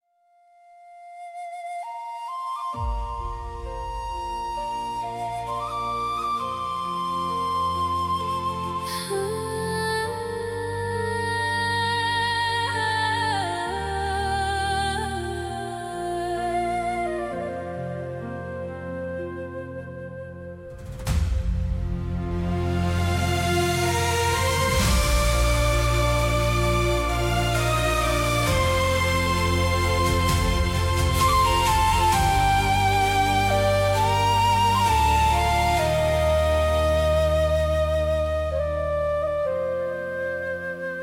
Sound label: epic poem; titanic